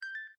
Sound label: Video Stop, ui; Asus; Zenfone-3-Deluxe